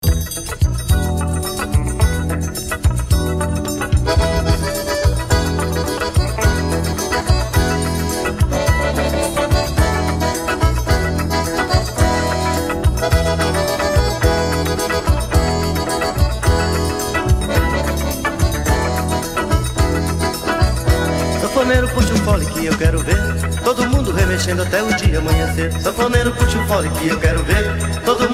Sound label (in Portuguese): forró